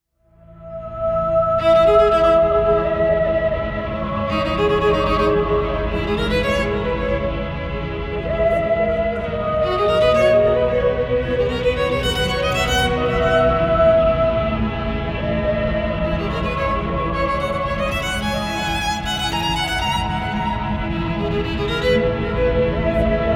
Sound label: Violin